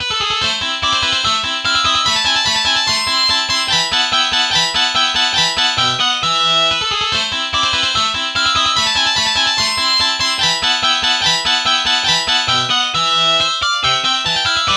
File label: distortion guitar